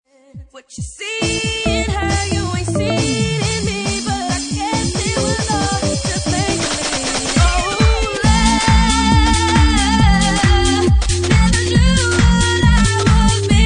Bassline; Bassline Meltdown Session 1

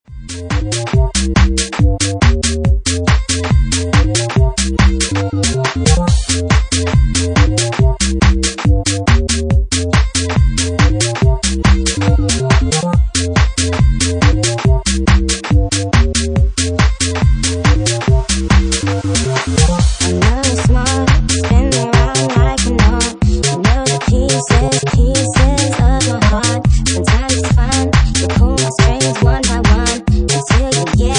White Noise

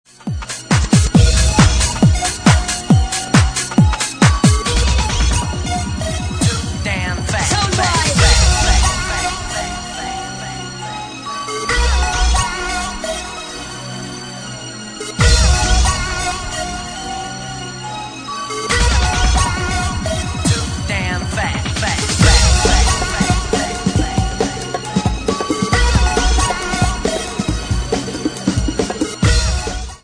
Bassline; Bassline Meltdown Session 1

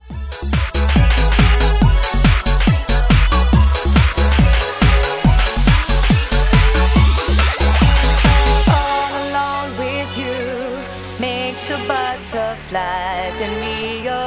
Bassline Mix; Bassline House at 141 bpm

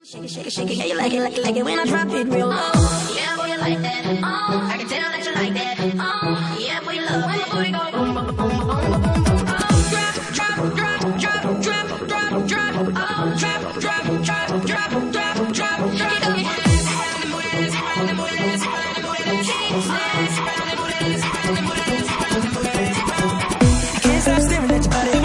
Organ Mix

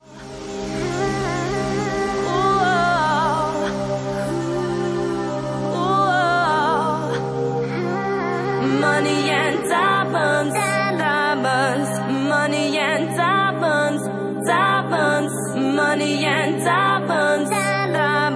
Bassline House

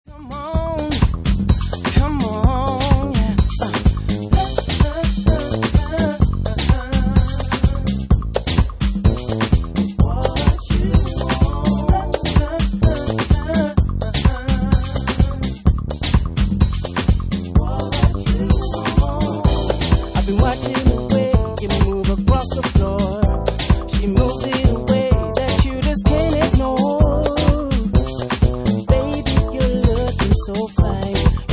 Uk Funky Sampler, Soul Mix

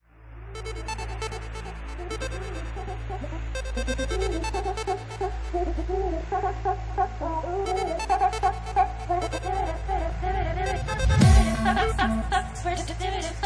Electro House Remix

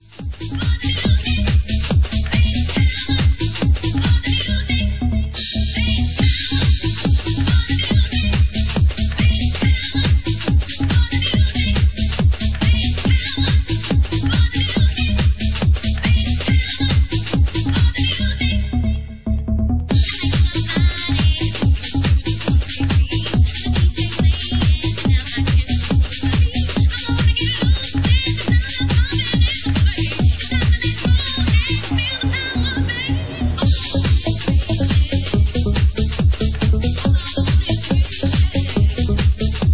Bass Power

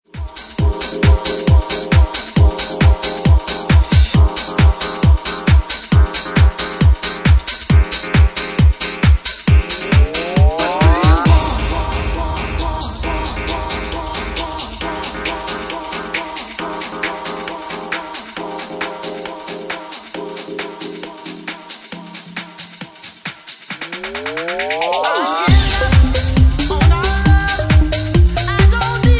Original Organ Remix

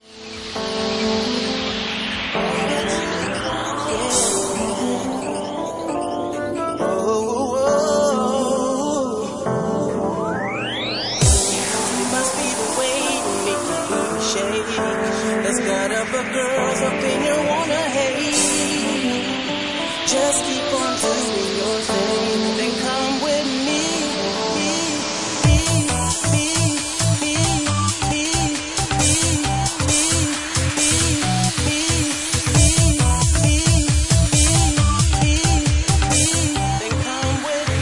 Organ Mix

